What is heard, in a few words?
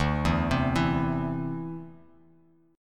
D 7th Suspended 2nd down Bass Standard